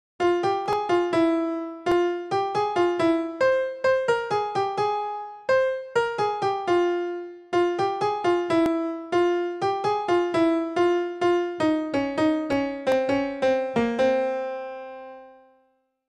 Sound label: mungaru male bgm piano, kannada ringtone; mini bgms